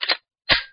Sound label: gun reload